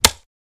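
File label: Staff Break Hit Quick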